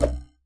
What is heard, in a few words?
shoot